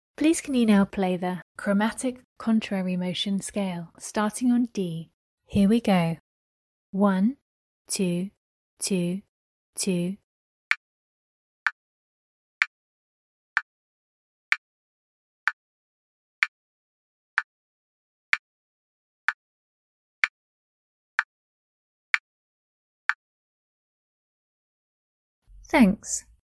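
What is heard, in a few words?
chromatic contrary motion scale starting D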